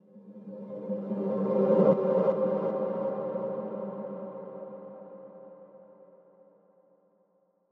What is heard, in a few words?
Dark Sweep